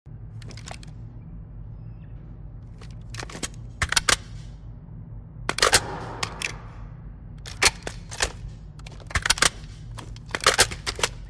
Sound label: Gun; بازی کانتر استرا